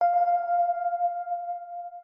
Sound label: organ